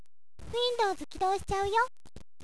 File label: win